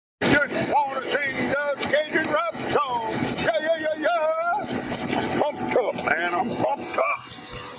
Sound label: Swamp Rap